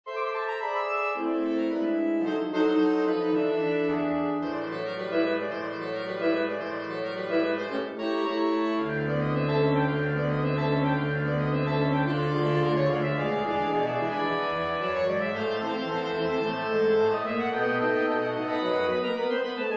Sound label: Saxophone; Gershwin; Rialto Ripples